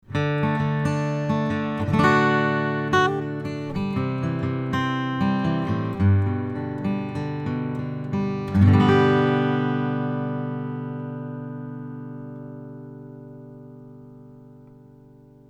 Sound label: Cardioid Picking